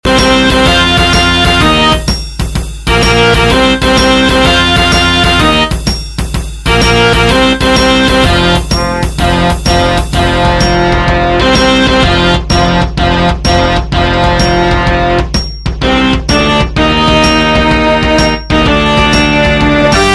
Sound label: Rocknroll